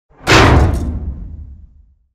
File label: drop safe miss